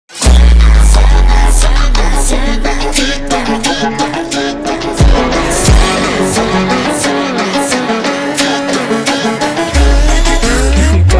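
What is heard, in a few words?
ultra Slowed, phonk music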